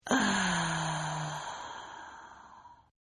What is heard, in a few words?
Groan Female; Groaning; Voice